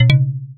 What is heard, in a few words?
pickup